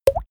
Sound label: glug; comedy-cartoon; soundpool